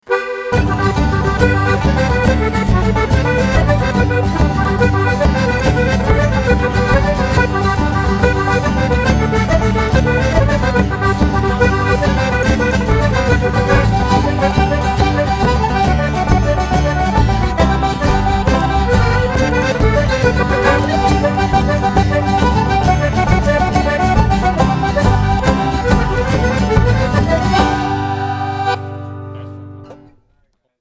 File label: washer woman jig